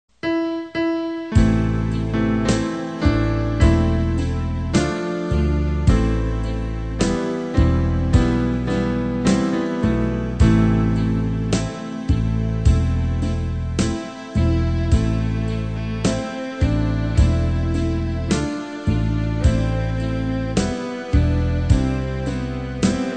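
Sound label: soprano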